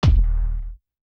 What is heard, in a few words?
COLLISION BASS; SIMUZENSOUND